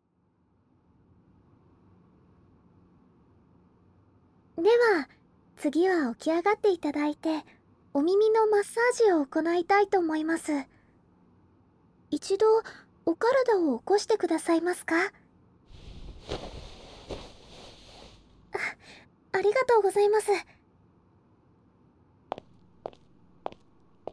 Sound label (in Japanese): 両耳 同時に オイル マッサージ